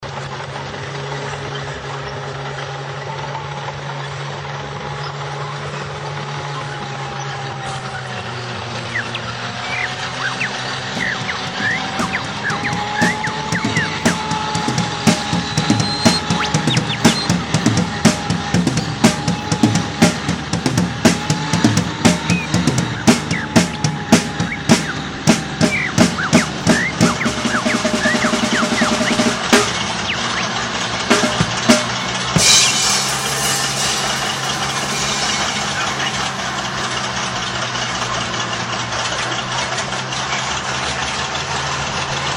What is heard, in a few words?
bird drums tank; Sill for Loud